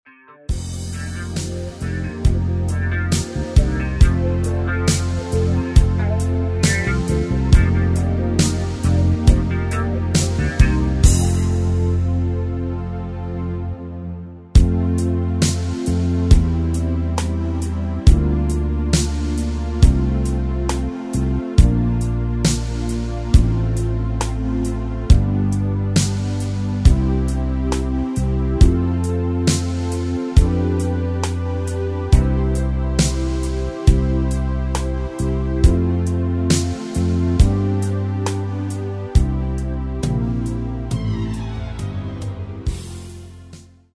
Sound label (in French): instrumental